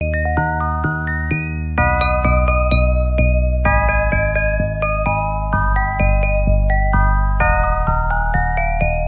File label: eery song